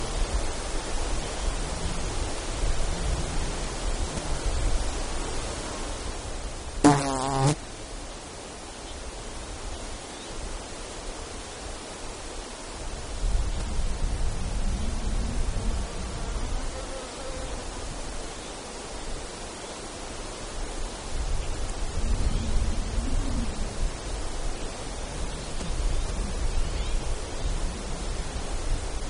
Fly Sporadically Buzzes; alaska acoustic highlights; INSECTS

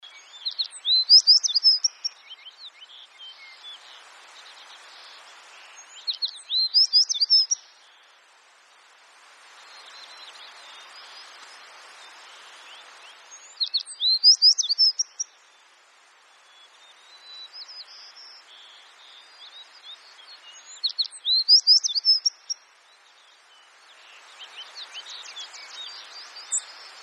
American Tree Sparrow Song With Chorus; alaska acoustic highlights

